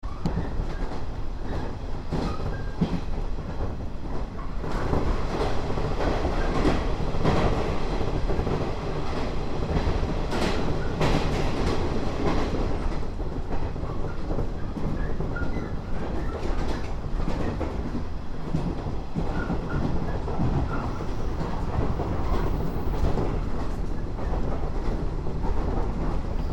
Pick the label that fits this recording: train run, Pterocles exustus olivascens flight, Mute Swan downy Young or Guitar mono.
train run